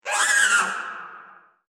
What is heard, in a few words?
Freaky Creature Shriek; Monster Sound Effects; horror sound effect